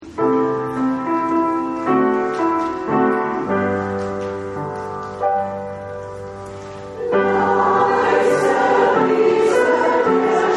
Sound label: chor